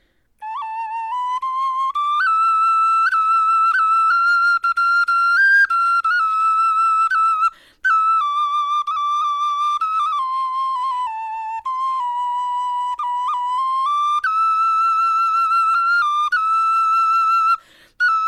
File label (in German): melodie